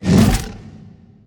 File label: Monster; Zenless Zone Zero